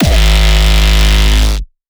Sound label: Hardstyle kick